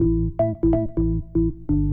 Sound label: ORGAN HOUSE 125 A; eJay; Keys